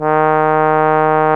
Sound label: BRS TRB, Trombone; Roland L-CDX-03 Disk 2